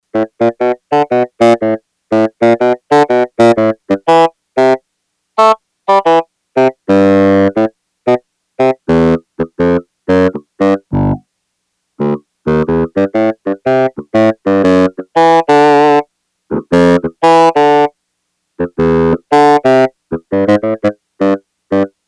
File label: Bassoon, Yamaha TX802; Factory Patches; Voices